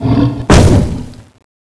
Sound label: skill start